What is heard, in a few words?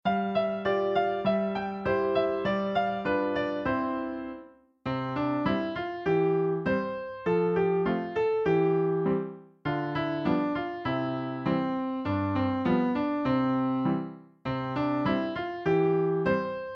tommy thumb instr